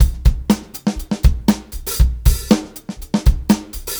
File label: Live Edgy Drums